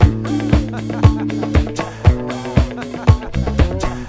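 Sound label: LAUGHIN, 118 bpm; USB Soundscan vol.32; AKAI; Roots Disco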